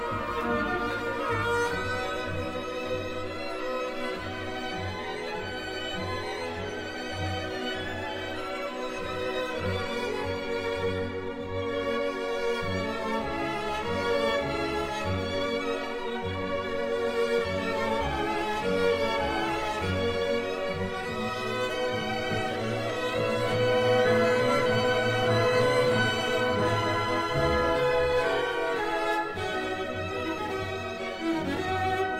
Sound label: Cello Concerto; Dvorak Maazel Berlin